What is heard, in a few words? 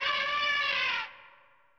Maya Chant; Killer Instinct Gold